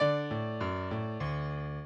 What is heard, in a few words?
minuet, mozart